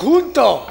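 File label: rhythmic vocal; Best Service; World Colours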